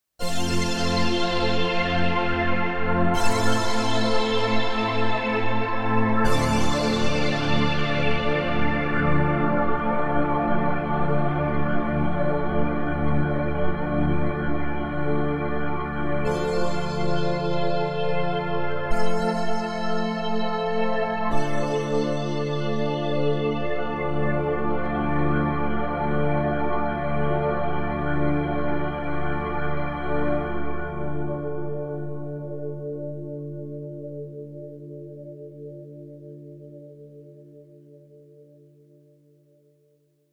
Zeus Light, yamaha sy77 pads

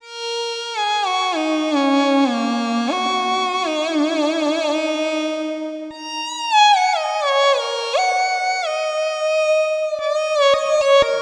Formant Erhu

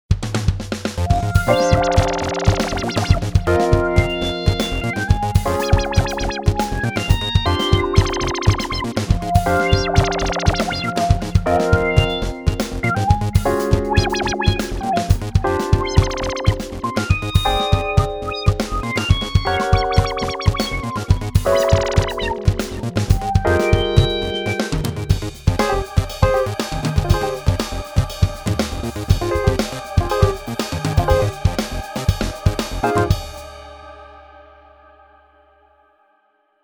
Jazz Fusion; alchemy; himalaya vintage

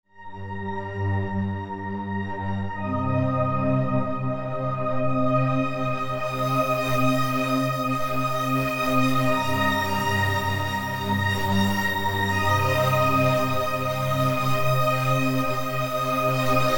Mordor Gate, yamaha sy77 pads

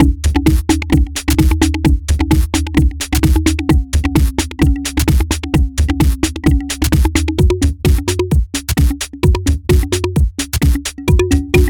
Percussion loops in context; Tassman4

